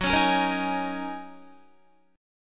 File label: F sharp 6th Add 9th F sharp Guitar; F#6add9; strummed